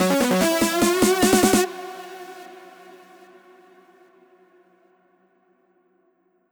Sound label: melody